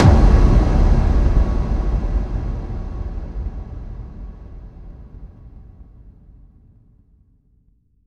FX Kicks

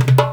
DARB, ETHNIC PERCUSSION; AKAI S6000 CD-ROM - Volume 5

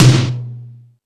Lazer Drums, E-MU Pro-Cussion; drum machine

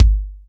butt kicker; Greytsounds; Scott Peers Sound Engineering

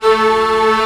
ORCH A0 PP, Orchestra; Sound On Sound; Peter Siedleczek's Orchestra

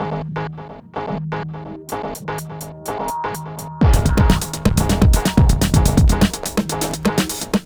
Hardcore Breakbeat, 125 Emin; Big Fish Audio; Hardcore Breakbeat Action; Marsattax

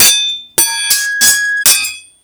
SWHIT, SWORDHIT; Total Control - Action Cutz

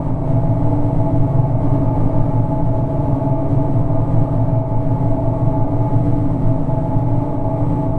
Drone Ambient; Drums & Drones

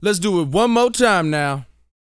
VOX, R&B Dance, HIP-HOP

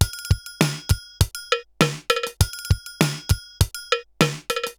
RX17 (100BPM), cyborg beats; musicradar

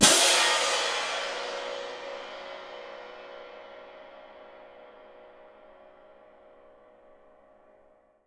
CYM MF, Percussion; Miroslav Vitous - Percussion & Harp

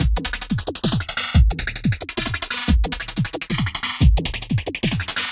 whippy 090bpm, downbeat dance grooves; Zero-G

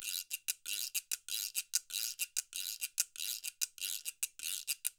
Percussion works loops; Sampleheads; NYC Percussionworks

